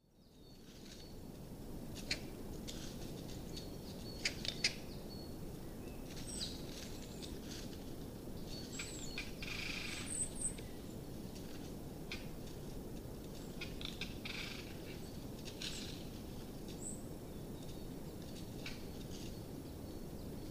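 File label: copper pheasant, chichibu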